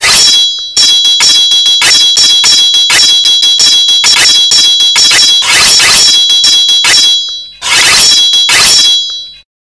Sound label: Blade